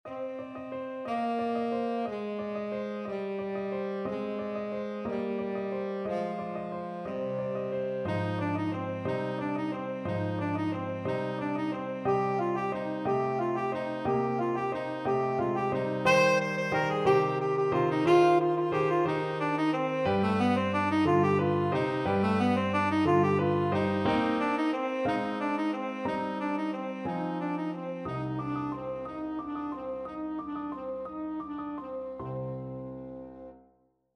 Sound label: tenor saxophone